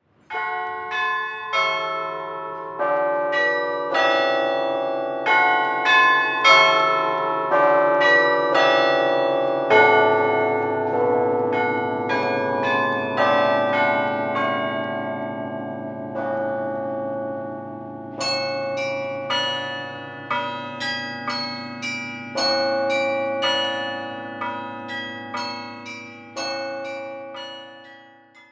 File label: carillon; Pavane de la Belle au bois dormant; Range: 3.5 Octaves